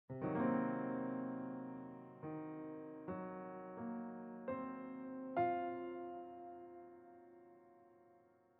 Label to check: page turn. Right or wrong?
Wrong